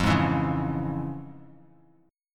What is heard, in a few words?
F Minor Major 7th Sharp 5th, F, Bass Standard; strummed; JGuitar